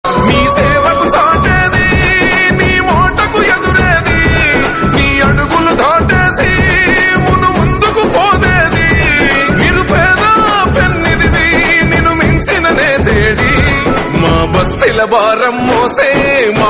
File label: telugu; nalgonda